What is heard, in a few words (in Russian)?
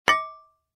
metallicheskiy udar